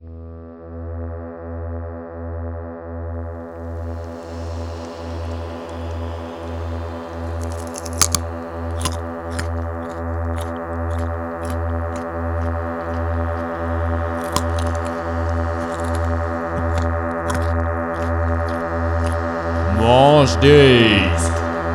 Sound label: Jingle